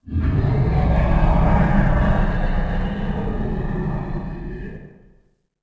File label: Motor Sucky; Ambient; Sid Meier's Civilization 4 Complete; Beyond the Sword